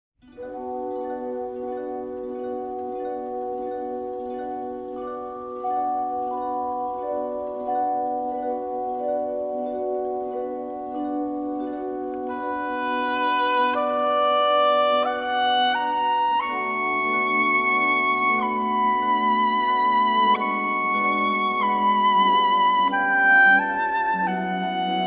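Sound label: Concerto No. 2 for Oboe - Molto adagio, Wind Symphony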